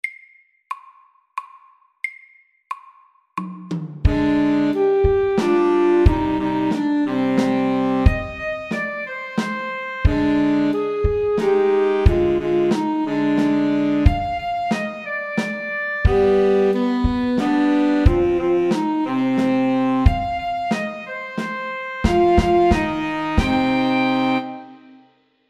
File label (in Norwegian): Ekko MEDIUM 90